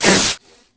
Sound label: roar; The Elder Scrolls III Morrowind GOTY; XBOX